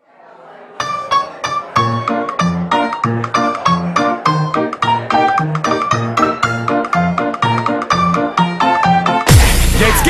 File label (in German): musik; jail old